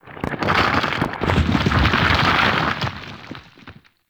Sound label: Reverse Rock Smash; Corpus Ship Remaster; Purgatory